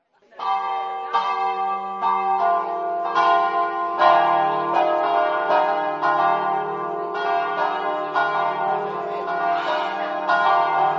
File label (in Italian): campane; vernasso